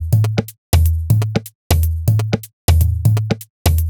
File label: Top A# 123bpm, House Drumloops; Balance; Zero-G House Fabrik Drumloops